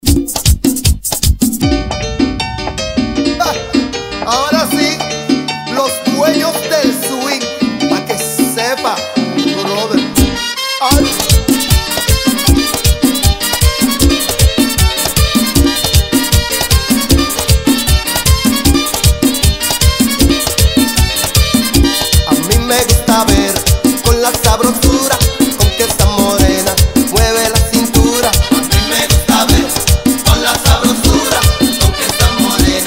Extended 155 BPM

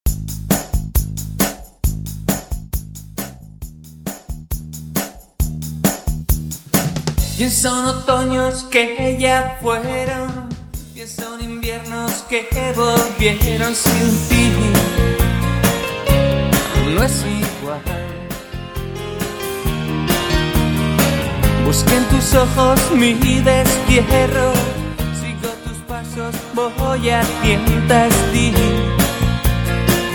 Acapella 67 BPM; Latin music remixes and edits

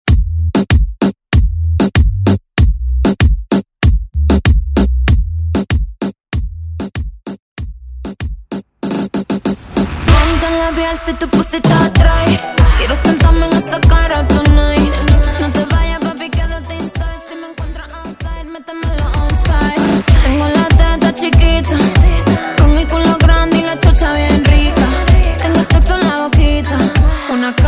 Intro Chorus 96 BPM

